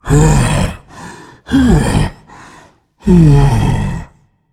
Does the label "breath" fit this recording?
Yes